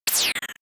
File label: arrow; project hawk